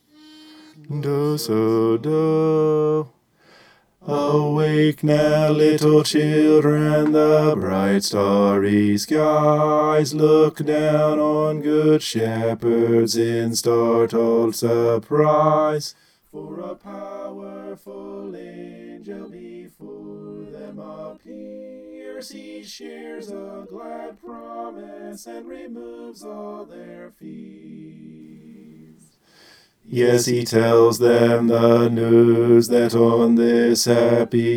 bass

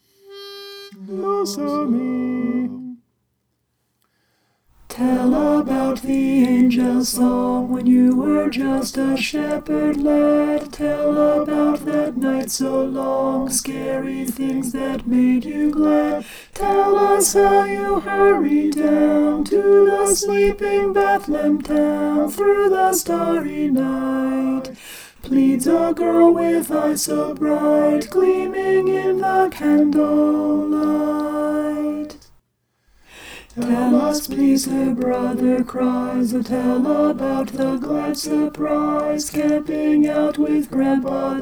through the starry night alto

